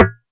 Perc; EFM

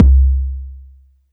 BD

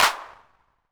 Clap 808 Color, Hand Clap

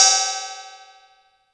Cymbal; TRX

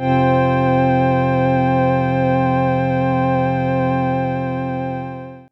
PAD Polygonz Pad

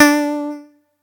atari 520st

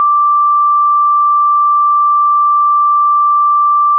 Clean Sine DX100 D5

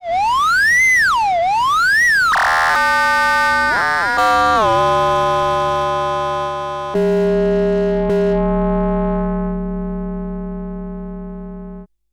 FX Robotio

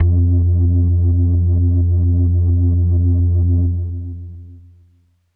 Leslie F1; organ; Kawaii

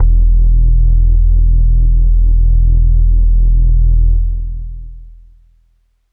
Leslie E0; organ; Kawaii

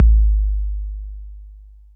BD 808 Smooth

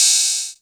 Hihat open

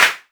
Clap; EFM

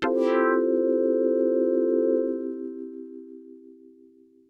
Synth, Splash Wah

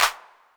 Clap 808 Saturated A, Hand Clap Multi Saturated

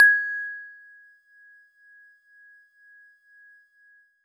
Warble Celeste DX100 G#-1

